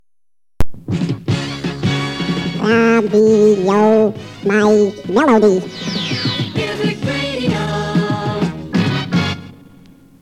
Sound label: music duck